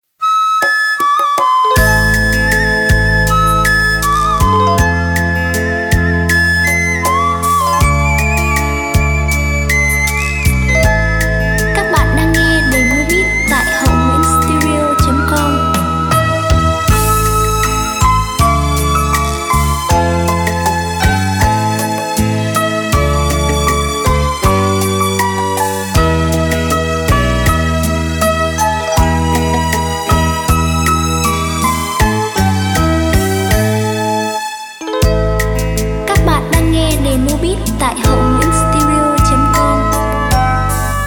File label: beat, playback